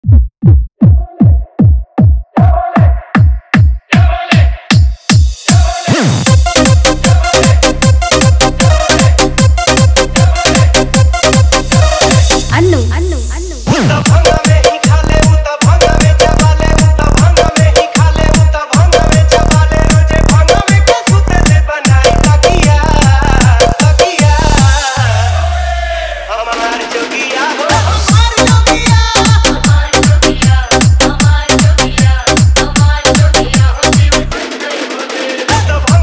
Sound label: Bolbam EDM Remix